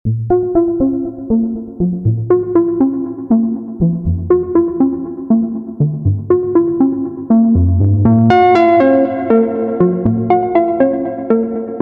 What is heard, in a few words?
Waves; modulate